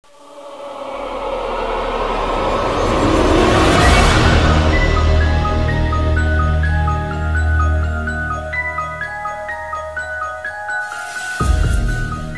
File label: swarm; cstrike; jailassounds